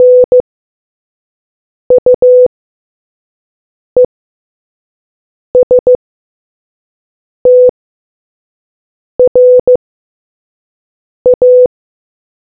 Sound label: morse